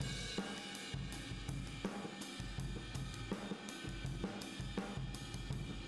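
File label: Boogaloo Chorus Room mono, drums, 82 BPM; Drums from the Big Room The Mixes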